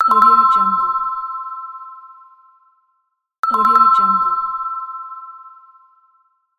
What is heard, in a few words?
good idea